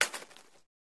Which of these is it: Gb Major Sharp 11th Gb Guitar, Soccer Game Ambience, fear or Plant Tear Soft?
Plant Tear Soft